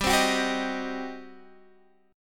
G Major 7th Suspended 4th Sharp 5th G down Guitar DADGAD